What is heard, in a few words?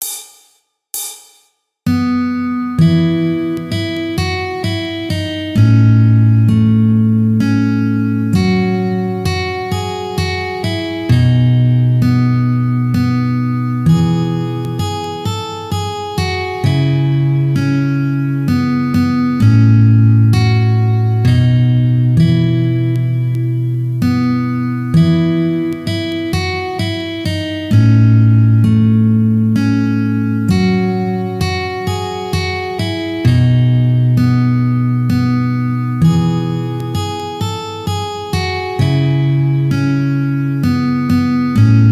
We Wish You A Merry Christmas Medium 65bpm